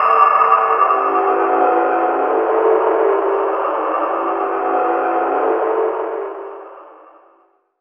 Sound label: synth, Synth Atmos (pad); Chillout